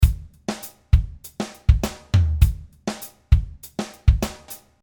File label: Drumloop; URS A